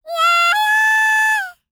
LICKS, Vocal Planet Jazz FX; Spectrasonics

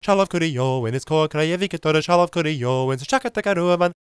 GAELIC, World Voices; ILIO; Vocal Planet

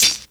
HH, Dance Mega Drums; Best Service; HH HIP

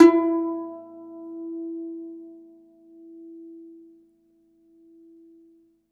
DOMRA, Segundo Domra, Plucked Strings

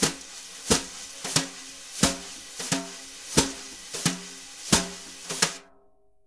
BRUSH, Jazzistic; Univers Sons

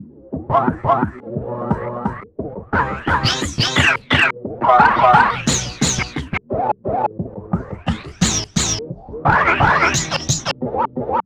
dub fx; EZRollers

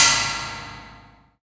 TEK SHEET, industrial; Roland - LA Composer vol. 1

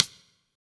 STICK; East West

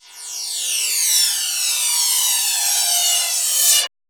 METAL SW, Pads; Distored Reality 1&2

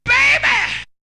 Vocal; ZERO G - Datafile DISC 1

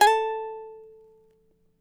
CHARANGO; Spectrasonics; Hans Zimmer Guitars VOL-2

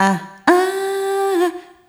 Rhythmic G

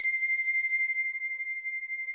BELLS C5; JDBELLS; Synth

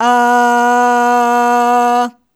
Aah Short B2 Dry

